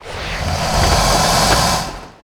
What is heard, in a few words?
vox